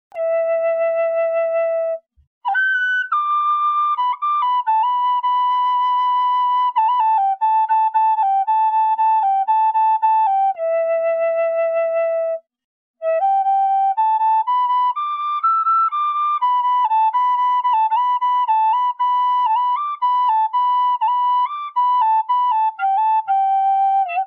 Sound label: High E Eastern Cedar, flutes